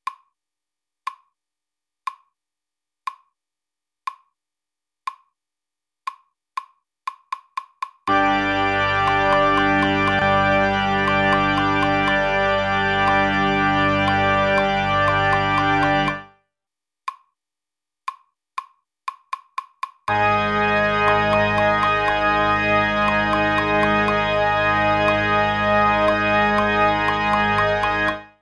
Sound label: Clicks 6 notes G, tuba